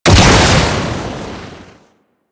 Bow First Explosion, Weapons; Warframe; Corpus

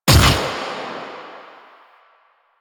Fire Shot; Duviri; Incarnon Adapters; Incarnon Sybaris Prime; Weapons

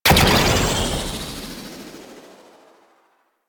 Boost Thruster; Vehicles; Archwing Prime

